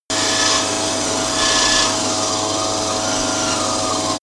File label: saw metal free